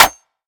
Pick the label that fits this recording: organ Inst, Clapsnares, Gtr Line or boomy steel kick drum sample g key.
Clapsnares